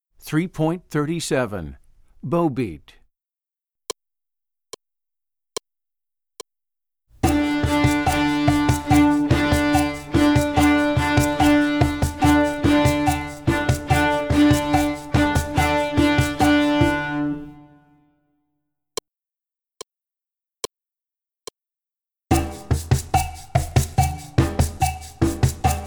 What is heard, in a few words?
Bow Beat